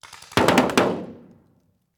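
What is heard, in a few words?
Paintball Gun Impact; Sound Ideas; Guns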